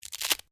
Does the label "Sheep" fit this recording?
No